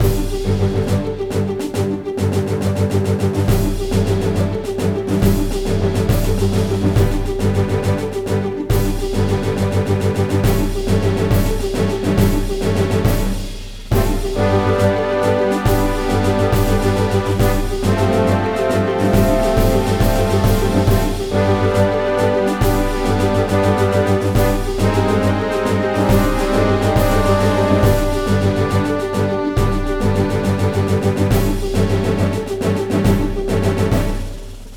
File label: BGM Mission; wicked monsters blast hd; wii u